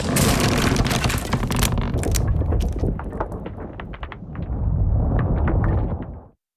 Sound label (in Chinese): 棺材怪脚步氛围音效; 第二章